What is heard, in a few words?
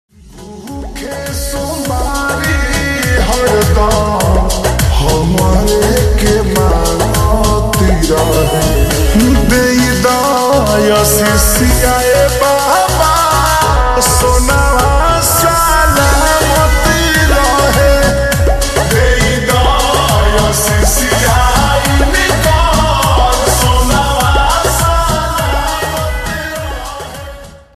Slowed Reverb Ringtone